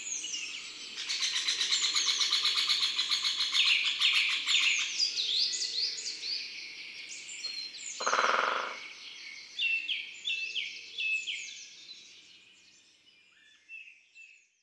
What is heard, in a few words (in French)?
Cris du pic epeiche; Drome; Concerts Naturels de la Drome; Studio les Trois Becs